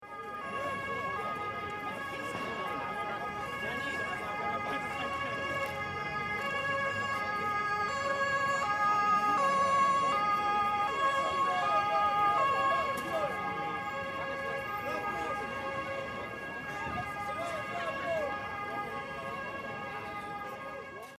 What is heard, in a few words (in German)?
Silvester Martinshorn